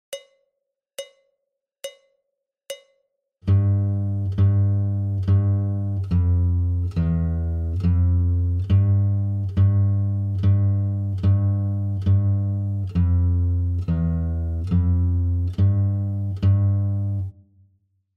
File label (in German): Warm Up G tief, Gitarre